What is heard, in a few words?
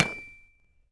Hit Metal Spike; Civ4 warlords